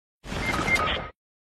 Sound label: Knocked Out; Fortnite